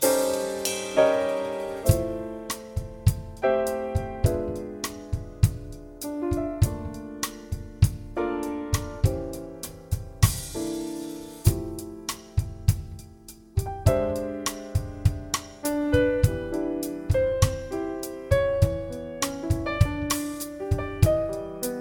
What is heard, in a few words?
PnoDr